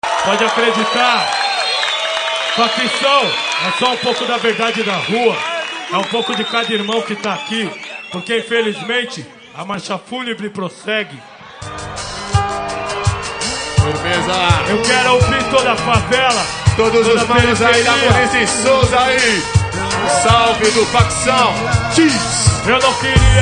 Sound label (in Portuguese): rap ao vivo; sampler rap